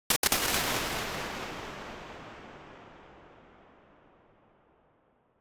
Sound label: BIG HALL, impulse responses